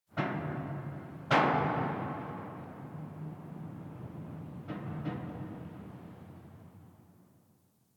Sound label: Ambient Metal Bang; Ambience; Warframe; Nineteen Ninety Nine